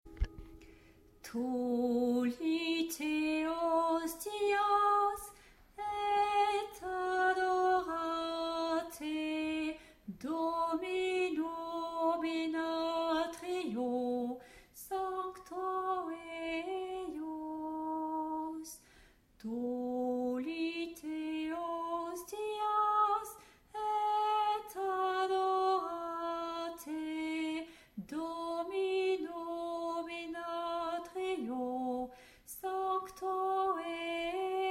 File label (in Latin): tollite hostias alto